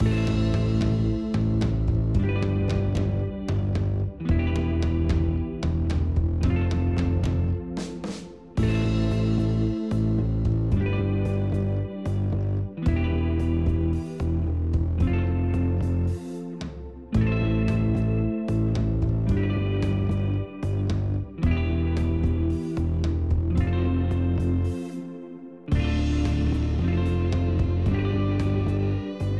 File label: Indie Tune; Dream Daddy: A Dad Dating Simulator